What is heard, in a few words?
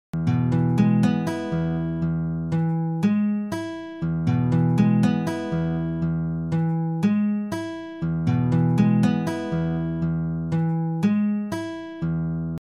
ring